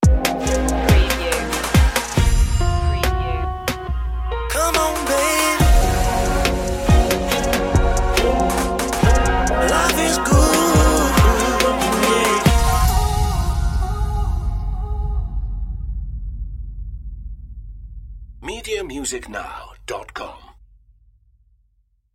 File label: smooth soul vibes